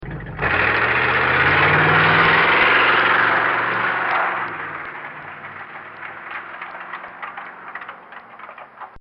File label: Hot Rod Pulling Away; Cars (Sound Effects:Vehicles)